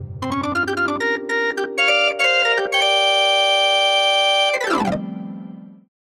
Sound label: Percussive Organ; Waverator Spectra; syntheway